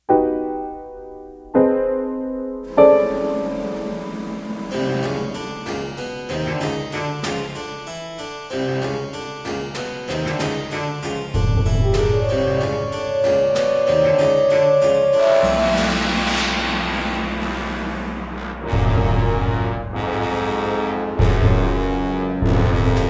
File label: stereo spooky